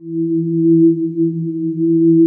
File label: cho e2, Choir Female, SYNCLAVIER; Retro Sampler